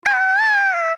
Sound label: aaaah; Poni Salvaje